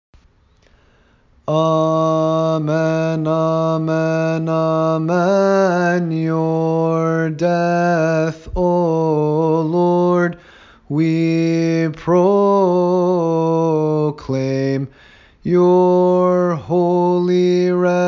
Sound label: Amen Amen Amen